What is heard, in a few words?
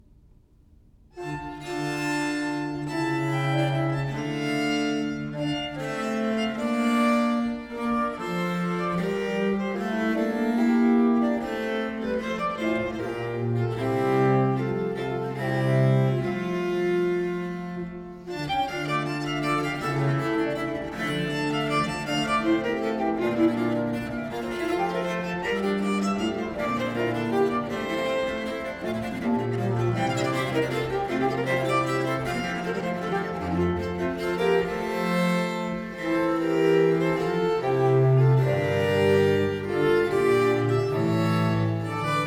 suite in d minor for treble, 2 basses & organ, English music for viols